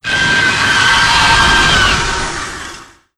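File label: raptor attack; sf effects; sinfar haks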